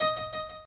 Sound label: harp